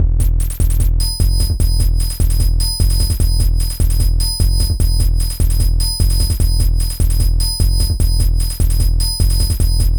beat with hats; Haskell-Synth

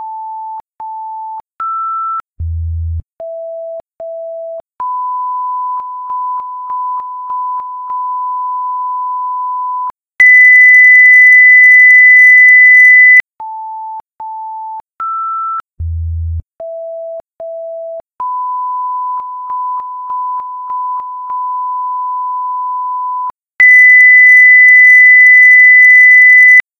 BLITS 5.1 Lineup; Demonstration; vhs-decode

